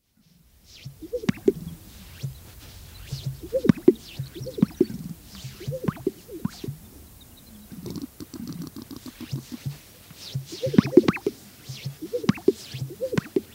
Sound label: Greater Sage Grouse Male Display Calls